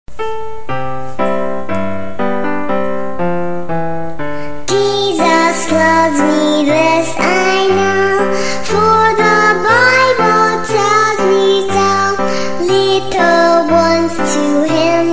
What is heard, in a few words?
Piano 4 kids Music